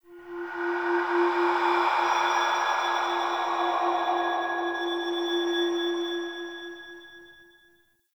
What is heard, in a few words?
Cave Shrine Spirit Bells, ambience; Midrath Island